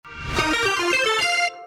alert; twitch bites

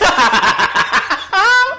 hahahahaha

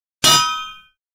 anvil drop

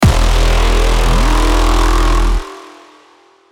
stretchy fart

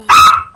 aaahh

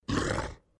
death; abyss brutes

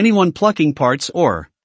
anyone pluck

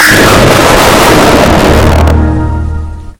windows xp shutdown

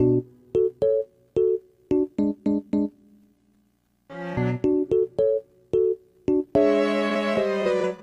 wii music

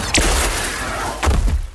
punch; baoh

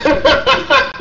laugh

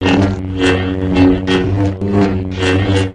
lightsaber sequence

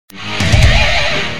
squeel; 91 wylde

